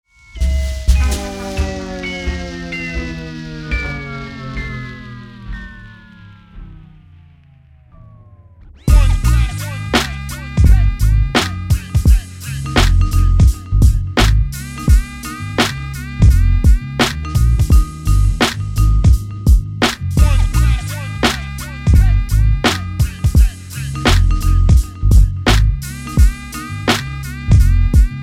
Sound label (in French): jazz extrait